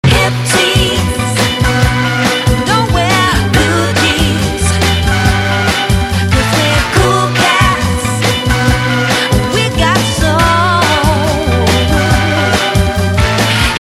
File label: bbox